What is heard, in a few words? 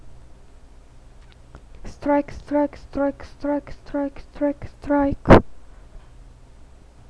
strike